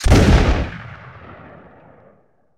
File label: Musket Single Shot Close; Period Combat; Sony Pictures Sound Effects Series